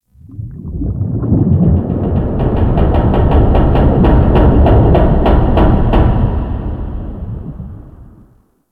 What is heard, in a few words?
Ocean Hull Creak; Warframe; Grineer Ocean